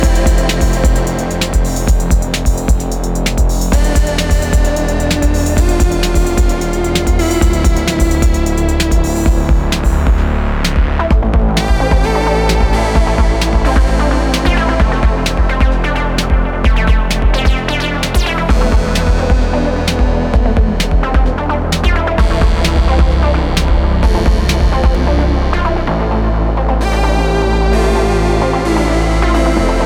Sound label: Synth Alien